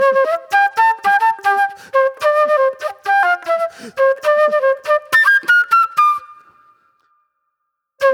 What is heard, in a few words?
Old Soul Flute